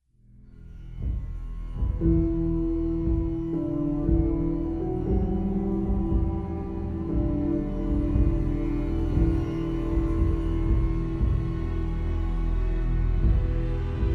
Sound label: score Desert; Journey